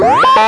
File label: siren turbo car; The Official Guide to Babylon 5